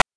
PITCHED NOISE; NES DPCM; MIDINES

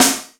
SD, Yamaha RY-10, Drums

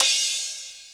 HIHAT HIP; Best Service Dance Mega Drums

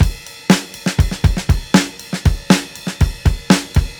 RIDE, breaks, drums; Basement drums vol 2

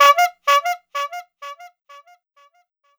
HORN ECHO R; USB Soundscan vol.27

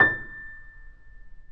PP A 5 off, Grand Piano off mic; AKAI S6000 CD-ROM - Volume 2

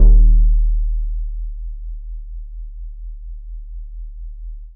Mowe Bass, Bass One-Shots, Drum n Bass; KJ Sawka - Drum n Bass & Beyond

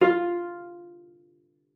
cello pizz F4; 90 s Sample CDs; Xsample VOL-5

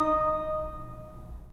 3D Pipe Mix Celeste, 3D Organs; E-MU Producer Series Vol. 5 – 3-D Audio Collection

